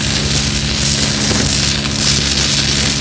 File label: lightning; hunger